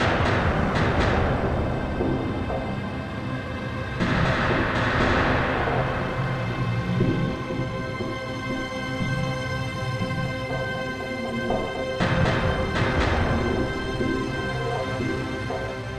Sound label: Danger Tonal; Insanely Twisted Shadow Planet